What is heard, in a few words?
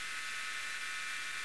buzz; cs snt